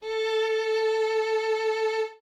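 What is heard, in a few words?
la skripka, violin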